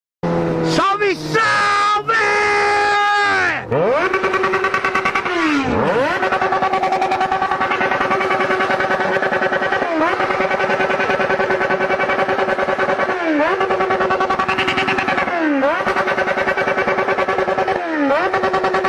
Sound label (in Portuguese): toque hornet; toques para celular